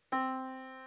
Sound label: yamaha Disk